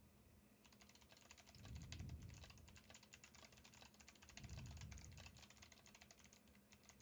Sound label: Typing